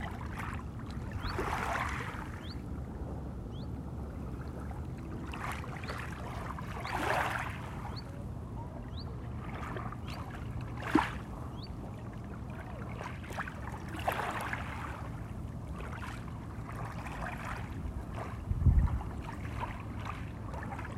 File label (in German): Wasser langsam mit Voegel